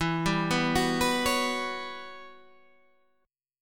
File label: E Minor 6th Add 9th guitar chord, Standard; Em6add9 arpeggiated; JGuitar